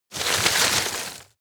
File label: rustle hard short; foerster